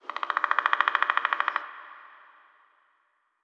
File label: ambient; World of Tanks